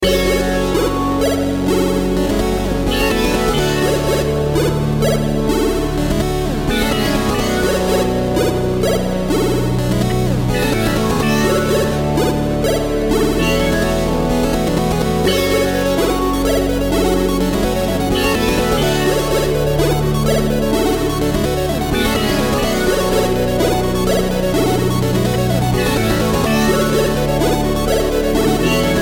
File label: Digital Chiptune